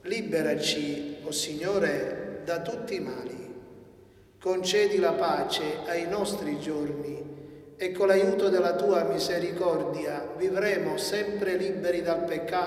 messe

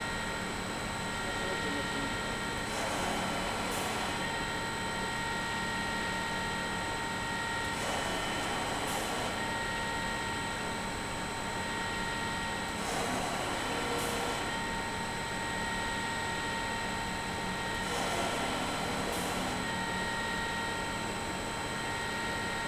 machine a teinter

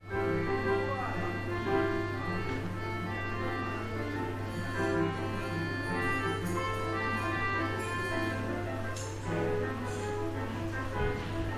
ecole de musique

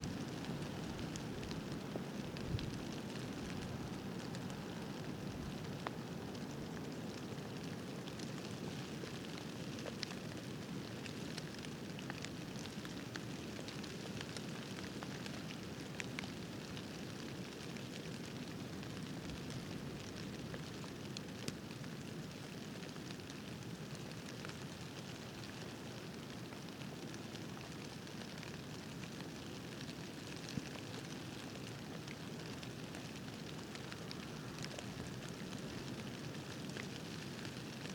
pluie

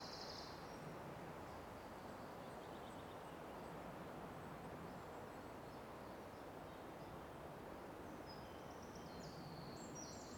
foret

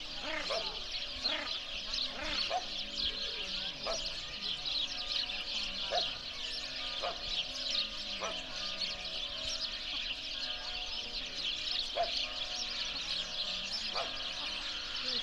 neige

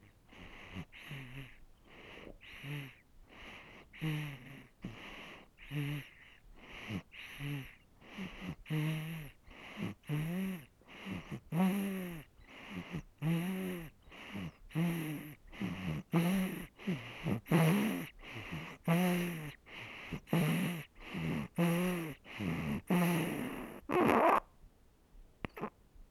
ronfle

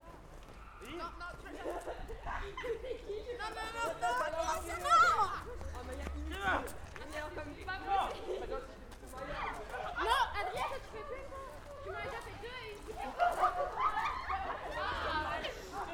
boules de neige

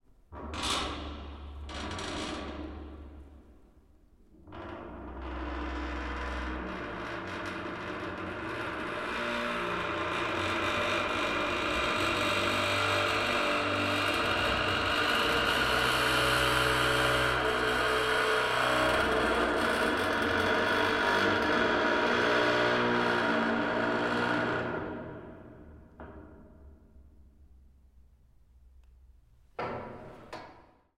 porte metal

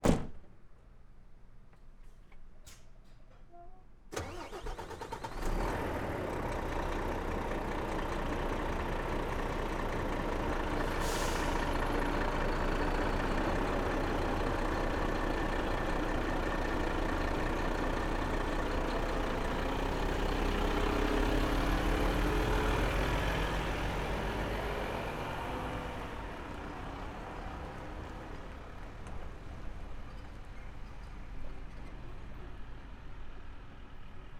camion vroule

